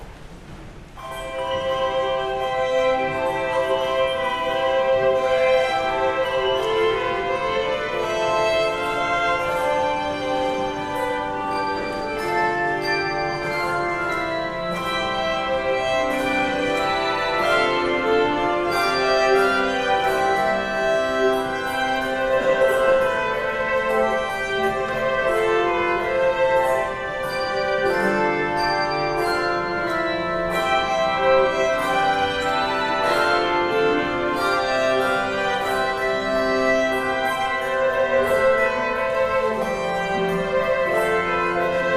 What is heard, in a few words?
Handbells and Strings; Lord Take My Hand and Lead Me; Behnke